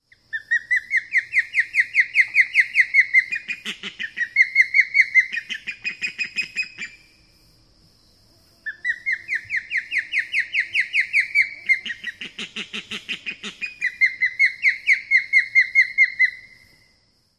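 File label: Osprey, alarm calls near nest number 1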